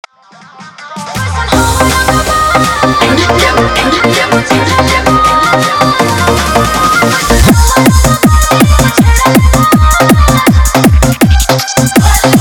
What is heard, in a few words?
Bhojpuri Dance Full Hard Extra Bass Mix